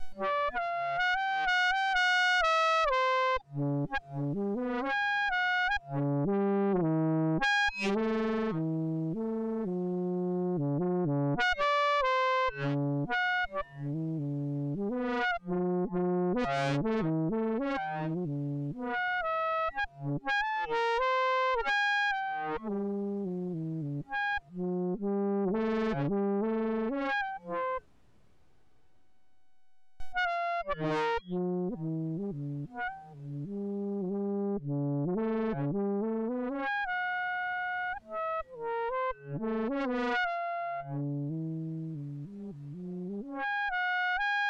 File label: multidelay